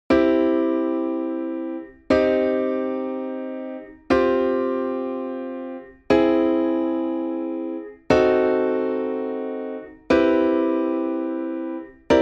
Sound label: music